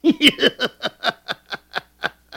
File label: man